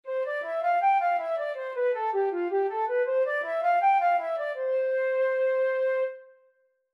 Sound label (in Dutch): dwarsfluitmuziekje